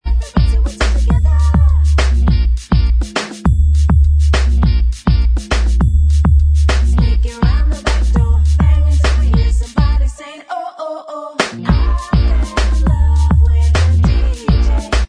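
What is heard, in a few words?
backing vocals, track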